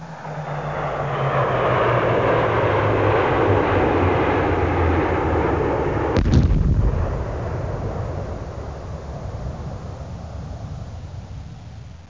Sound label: JET